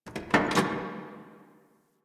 Parking Lot Car Door; Warframe; Ambience; Nineteen Ninety Nine

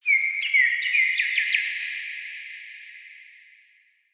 Cardinal Female React Positive; Objects; Thawtide

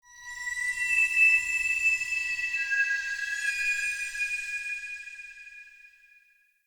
Cave Mysterious Distant F, Ambience, Shrine Cave

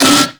Kubrow Armored Vocal Pain; Warframe

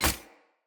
Unarmed Parry Impact; Weapon Impacts; Player; Combat

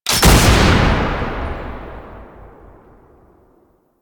Assist Shot; gameplay; Warframe; Nineteen Ninety Nine; Quincy

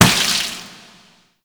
Party Balloon Pop; Gameplay; Warframe; Shadowgrapher

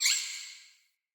Vocal Single Squeak, Animals; Player; Player Floppet

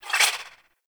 Swing, Weapons Melee; Warframe; Modular Melee

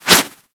Kubrow Melee Swipe

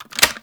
Reload; Warframe; Weapons; Spectra

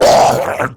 Pain; Battle Barks; Warframe; Grineer Ghoul Devourer

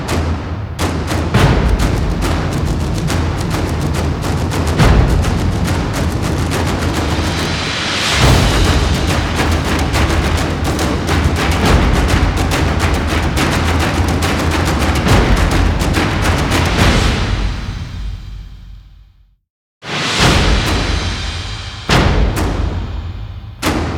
Cinematic Cue; Warframe; Junctions End

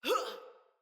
Attack Female; Battle; Envoy

